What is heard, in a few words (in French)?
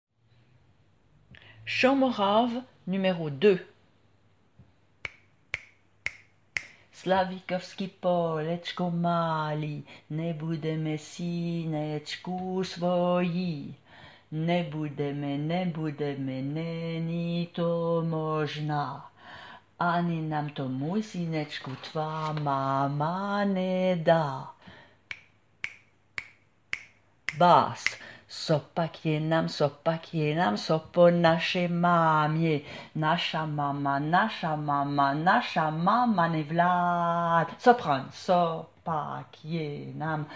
chant morave